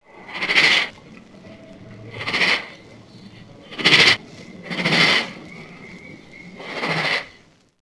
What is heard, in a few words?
Tiger